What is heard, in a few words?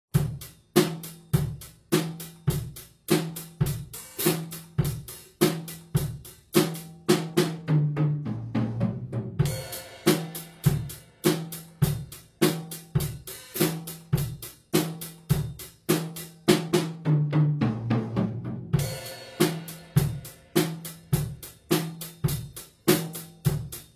drum